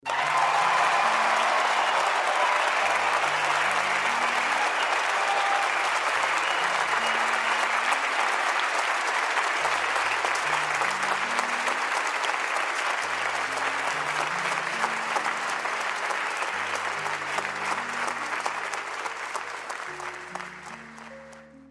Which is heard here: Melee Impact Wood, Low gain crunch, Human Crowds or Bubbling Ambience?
Human Crowds